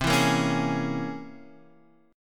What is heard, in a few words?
C 7th Sharp 5th Flat 9th C